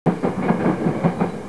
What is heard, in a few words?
point, Train; OpenBVE